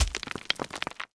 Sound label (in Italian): Muro Pericolante; BLIND QUEST - The Enchanted Castle